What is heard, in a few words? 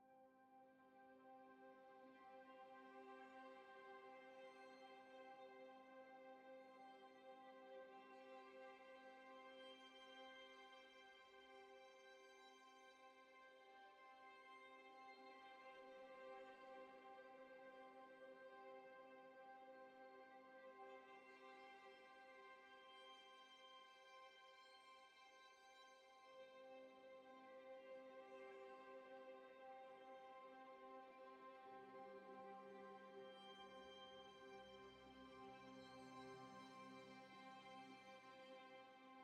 tonal Amb String C; Ori and the Will of the Wisps